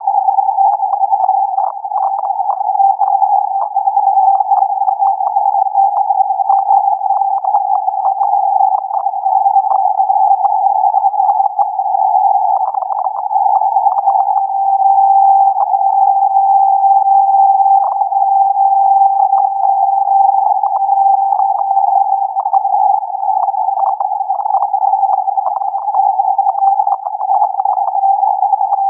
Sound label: 25 kHz; 25.1KHz; Beta Timecode 2 (RUS)